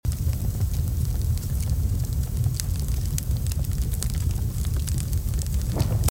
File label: Ambient Fire